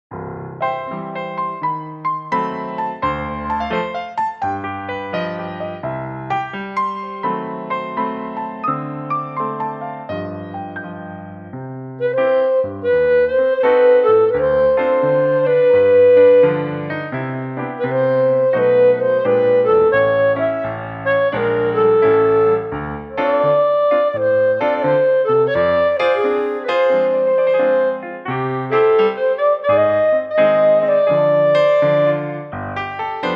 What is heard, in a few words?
clarinet